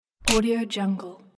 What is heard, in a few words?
digital bleep